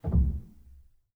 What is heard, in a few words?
rel, Grand Piano; bitKlavier Grand; Piano Bar